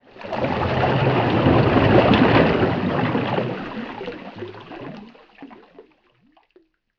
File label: Underwater Water Object Movement Splash Gurgle Bubbles Wave Against Boat